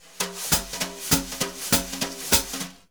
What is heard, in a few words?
BRUSH, Jazzistic; Univers Sons